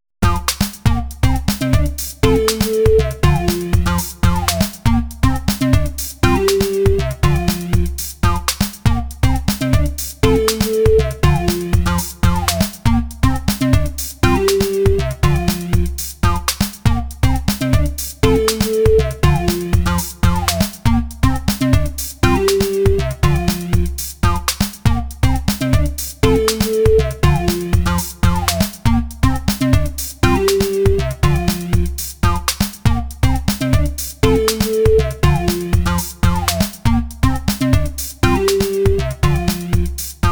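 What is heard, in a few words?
FM, synthesizer; lead; sinmad-synth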